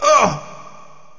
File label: fall; alienarena; construct